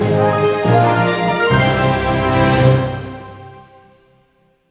Quest Success Stinger; GoldNut

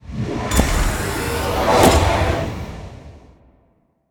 Teleport; Scraprun; Deathrun

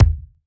Ball Collision Geo Tackleball Hard

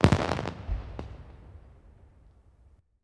Celebration Fireworks

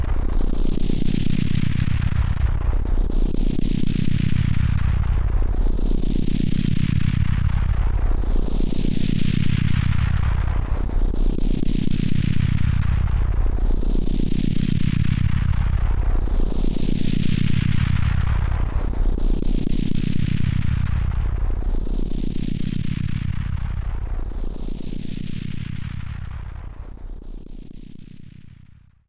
Idle Loop Main; Ion Fang

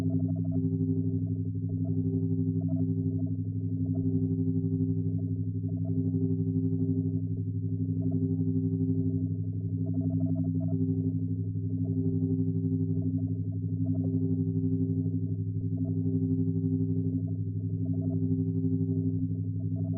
Movement Drone; Res-Q-Bot

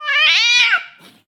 Angry, Hissing and Screech, Wearables; JASPER

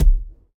Ball Player Hand Hit Tackleball Med

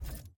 Hand Paddle Release Extra Light

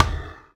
Ball Hit Med Breathy; Spinal Serve

